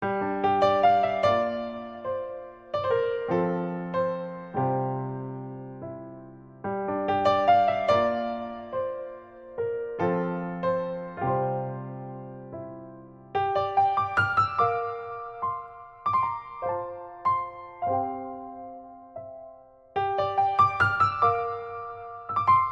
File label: Introspective Piano Etude